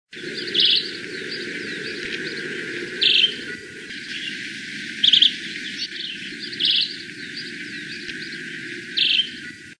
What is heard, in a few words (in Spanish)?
voz mosqueta estriada